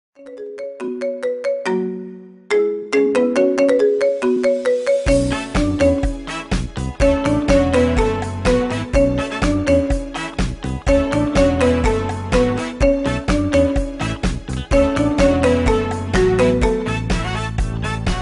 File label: marimba ringtone remix